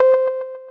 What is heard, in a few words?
IM notification